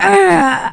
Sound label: pain, player; Quake 2; female